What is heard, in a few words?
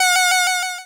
Cry